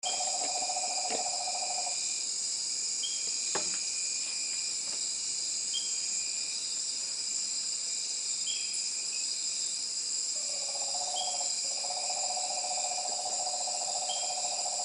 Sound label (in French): son forêt